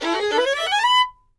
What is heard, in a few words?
FIDDLE RIFF E, FIDDLE FX; Sampleheads; Whole Lotta Country 1 & 2